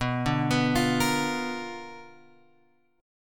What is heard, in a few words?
B Minor Major 7th Flat 5th, chord; BmM7b5 Chord {7 5 X 4 6 6} arpeggiated